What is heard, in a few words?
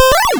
Hard PCM Chip; chiptune